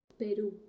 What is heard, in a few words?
perc